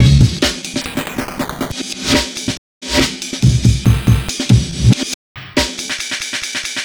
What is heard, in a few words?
Amen Breaker 140Bpm; vst fx bundle